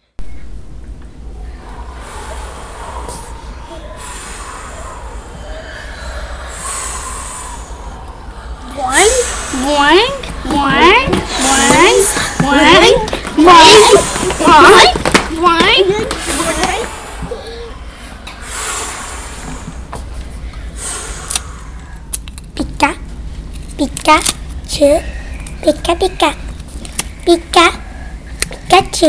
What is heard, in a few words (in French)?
Ambiance sonore; Germaine Tillon